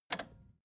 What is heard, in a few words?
record player repeat click fast